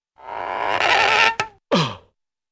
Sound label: tight stretch and exhale